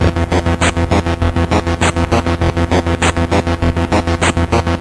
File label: steady